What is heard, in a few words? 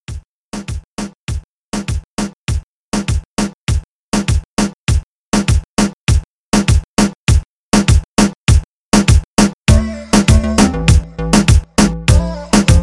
Club Intro 100